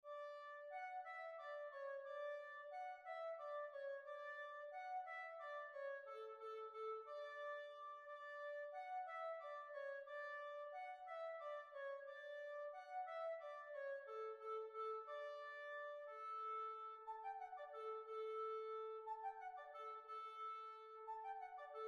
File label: Waltz; Lord St Vincents Waltz; B flat